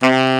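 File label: tenor sax hard C; Northstar - New Gold VOL-1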